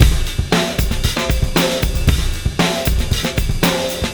Fusion 116, Beat Loops; Zero-G - Monster Beats

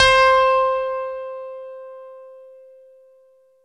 JV E GRAND, JV-1080, PIANO; Syntec; Wall of Sounds

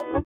sitar, Indiatek; Spectrasonics; Metamorphosis

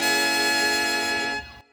Horn Stab

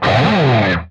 PRS SLIDE, guitar

